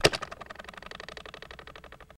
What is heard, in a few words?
Knife Impact, knives